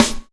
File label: Claps & Snares